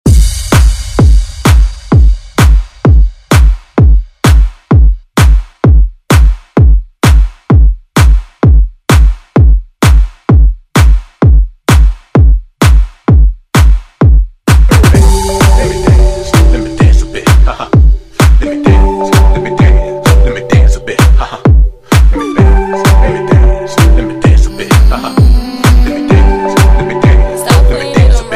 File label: Afro Dance Redrum